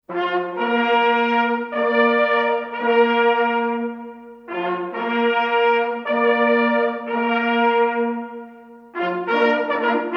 niedzwiedz na rozkladzie, sygnaly

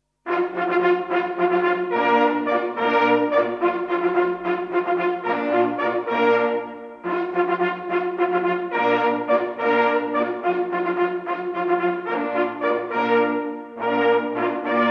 posilek, sygnaly

